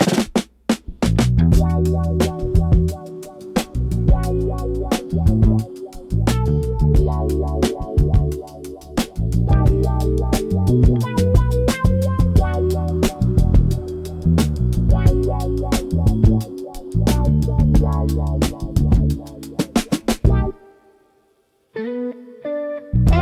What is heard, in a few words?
Chill Vibes